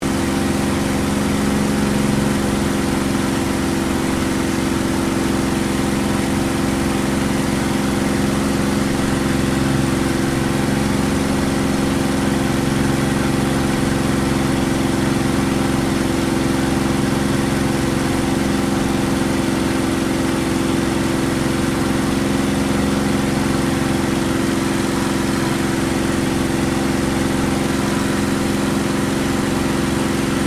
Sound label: AIRCRAFT CAC CA-18 MUSTANG MK23 FLYING INTERIOR LOOP; CA-18 /P-51 Mustang; Airplanes; Vehicles; Aircraft Collection; Twistedtracks